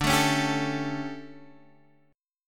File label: D Major 7th Suspended 4th, Guitar, Half Step Down; DM7sus4